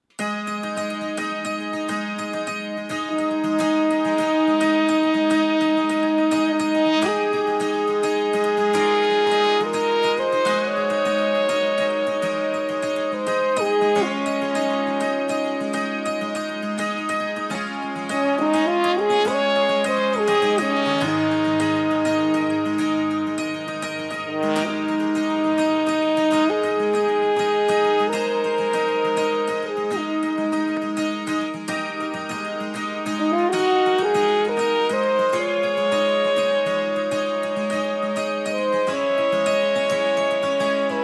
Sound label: Dulcimer Horns